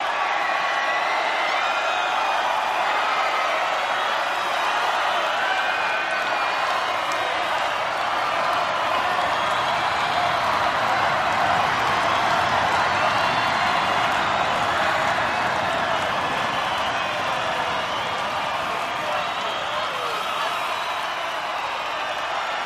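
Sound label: Crowd Screeching, concert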